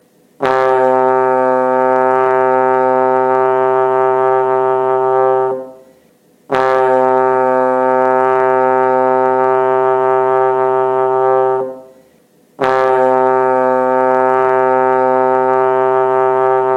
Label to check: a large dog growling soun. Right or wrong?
Wrong